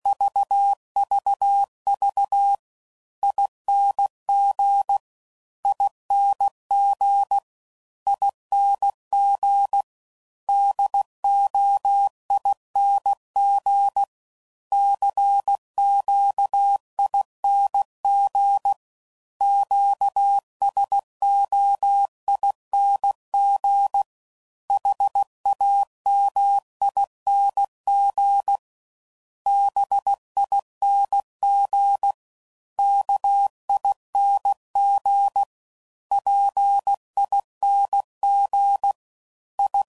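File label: Single Ings, CW Code Groups 15WPM; HamRadio; Morse Code; Mixed; Suffixes; Special INGs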